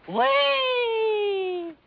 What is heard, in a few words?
wheeeee; Fair Trade